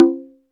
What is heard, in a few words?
CONGA4, PERCUSSION; Crypton - DrumBase5000; PERCUSSION#1